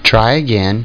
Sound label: lose